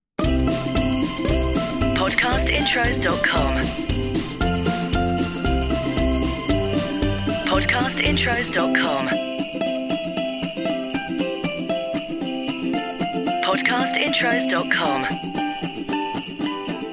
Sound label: CHRISTMAS Jingle Bells; Podcast Watermark